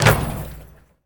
Street Ball Dunk Chain Wood; SFX Kit

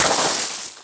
Bodyfall Gravel; SFX Kit; Bodyfalls

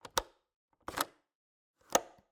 Cabin, window, latch, flip, lock, 3 moves, wide; Eiravaein Works; Vaeyan IV

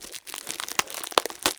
Wrapper Open, Kitchen; 1001 Sound Effects

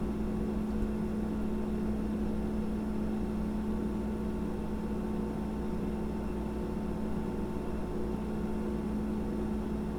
Refrigerator Hum; blindside

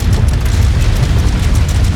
Drawbridge Loop; Doors

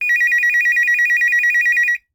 Telephone Ring; Communications; 1001 Sound Effects

